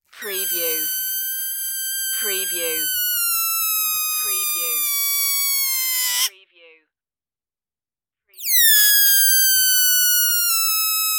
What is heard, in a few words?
whistling air balloon